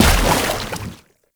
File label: Chem Strike Step Water, Footsteps; Enemies; Foley; Warframe; Lasrian Soldiers; Lasrian Chem Strike Nox